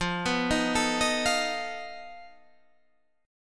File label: F 7th Flat 5th F down